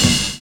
CRASHING; Univers Sons

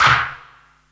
CLAP HOU, Dance Mega Drums; Best Service

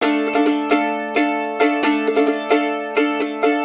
riff, Blues Guitar

